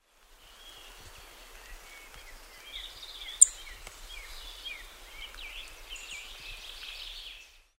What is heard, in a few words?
song thrush